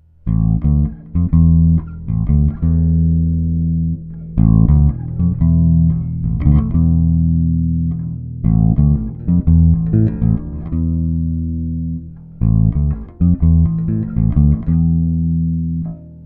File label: bass riff